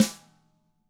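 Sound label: DW HIT, drums, snare; ILIO; Double Platinum Drums 1; DW SNR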